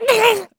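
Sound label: voice shock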